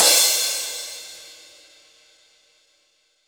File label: Crash, Cymbals; Roland BK-5